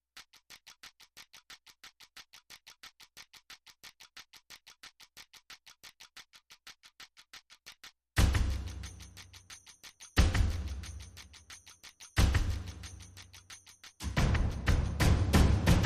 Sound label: Perc Only